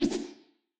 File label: anime whoosh twice